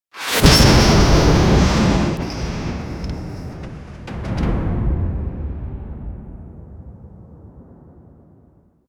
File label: sci-fi escape pod launch